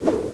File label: swoosh